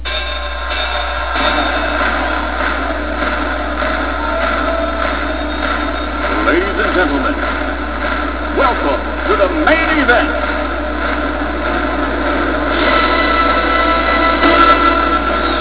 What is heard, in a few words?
Rumble